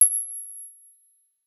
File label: dliiing